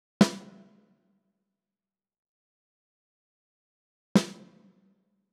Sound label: Sn room